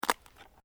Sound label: Branches Dead Break; Material components for constructing more complex sounds; Breaking